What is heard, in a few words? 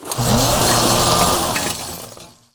Recyclebot Play